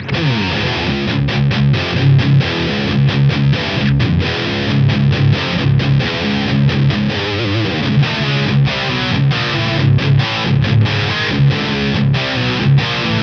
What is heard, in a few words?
metal; jn12-100t-spec